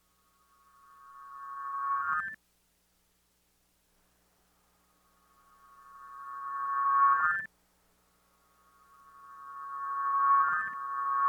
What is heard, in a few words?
reversed Rhodes